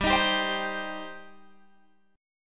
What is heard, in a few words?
G Suspended 4th Sharp 5th G, down, Guitar, Open G